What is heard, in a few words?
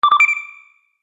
Science fiction Activate Sound; Sf Activate Sound